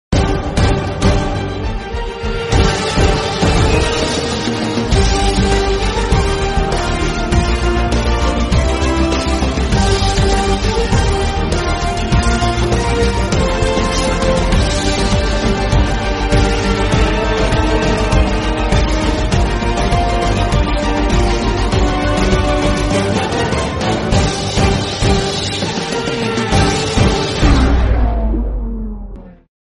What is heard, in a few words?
song instrumental bgm background music